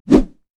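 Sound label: armadillo basic attack; rammus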